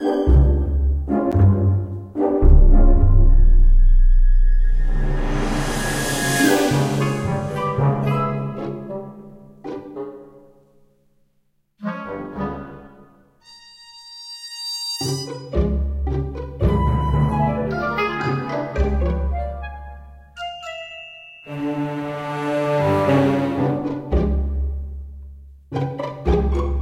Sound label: Quirky